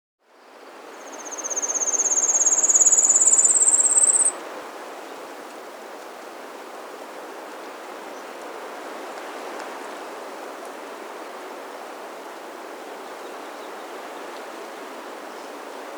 Brown-Capped Redstart (Myioborus Brunniceps); S. America; Andean Birds; Natures Songs

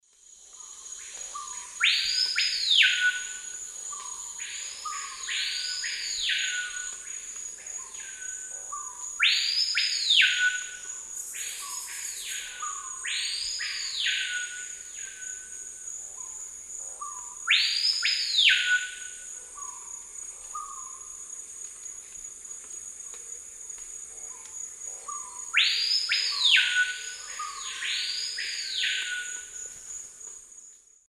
Lipaugus vociferans, lek songs; S. America; Eastern Lowlands

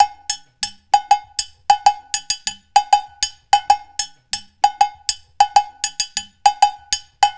Perc, Percussion Latin

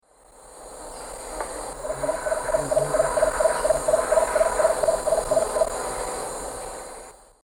Eubucco richardsoni, song; S. America; Eastern Lowlands

